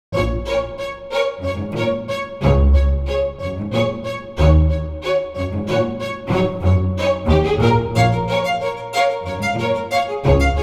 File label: Graceful Spiccato